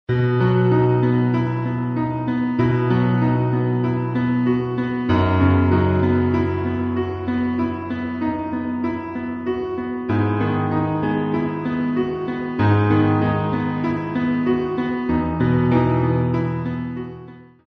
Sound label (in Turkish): santral fon muzikleri